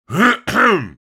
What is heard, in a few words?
Old man clearing throat